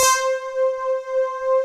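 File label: BRIGHT POLY; Pro-Rec - Synthsonic; TRINITY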